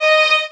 violin

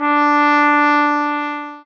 sax

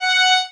violin

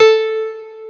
piano

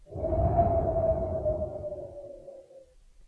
spooky, notes

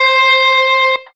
electric guitar

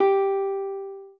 spanish guitar

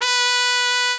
mexican trumpet

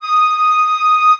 flute

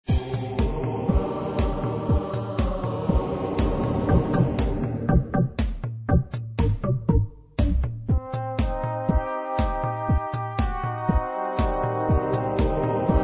loony song

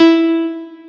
piano

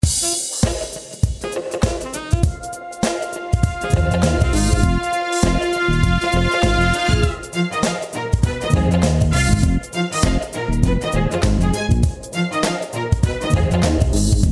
disco

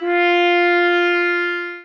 sax

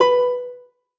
lyre

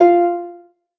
lyre

